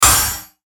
Hit Static Harsh Cymbal Accent, Hit Static Metallic Hiss; Production Element Imaging Element Accent Transition; Blastwave